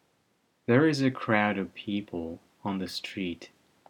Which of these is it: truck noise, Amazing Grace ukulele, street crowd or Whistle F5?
street crowd